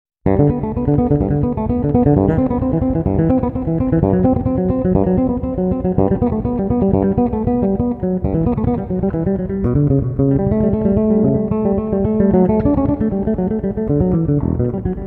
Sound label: Cello Suite No. 1 in G Major